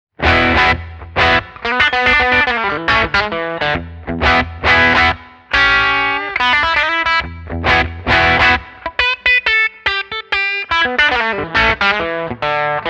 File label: Screaming Blonde DRIVE 12 h CHAR 12 h Tubescreamer off